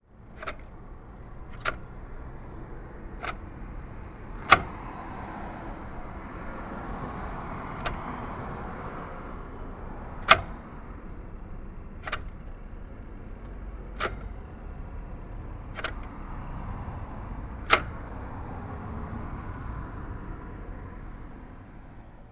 ticket validator; sergiyev posad

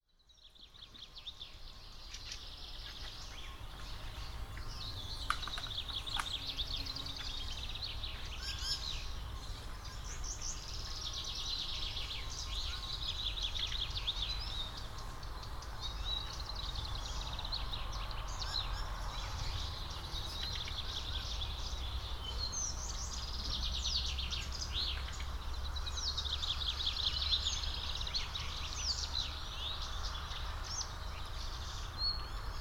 abandonded camp; zhutchki

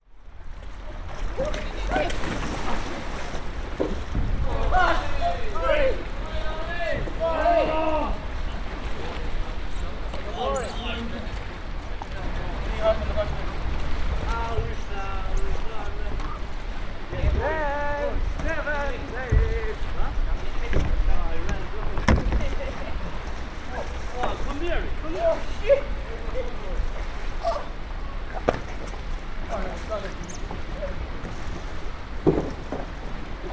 canoe class; limerick

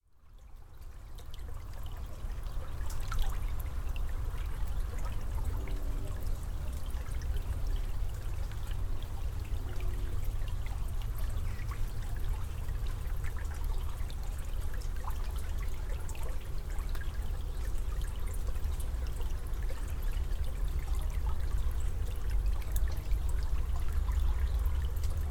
brook, Grazhdanka Sergiyev Posad

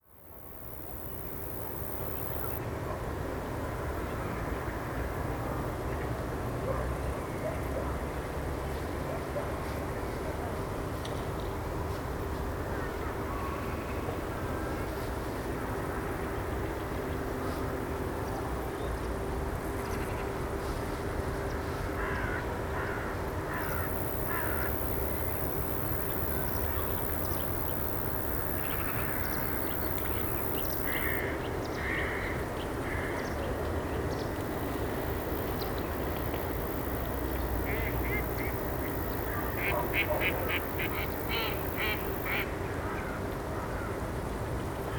reservoir; ptitsegrad; sergiyev posad

